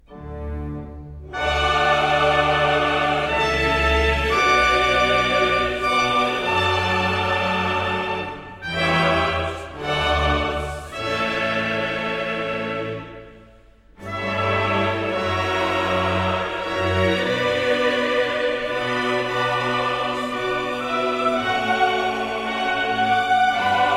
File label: worthy is the lamb that was slain, chorus